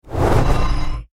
Science Fiction Vanish Teleport Appear Super Power; Tech